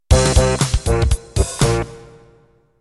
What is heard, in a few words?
Rap End